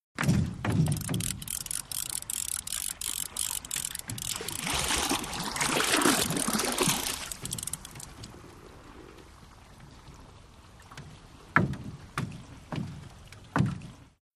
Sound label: Fish Thump Boat Reel, fishing